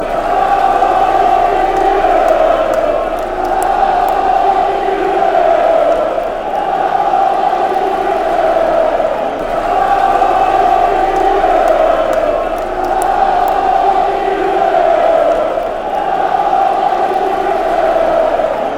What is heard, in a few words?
come you well, chants